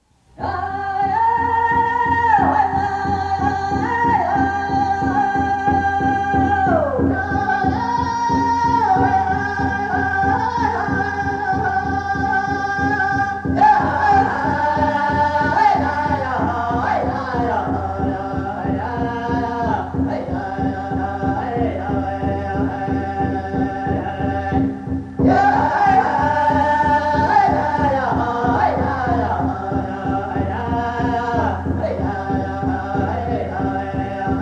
Taos hoop dance song, American Indian traditional chants; Flute Music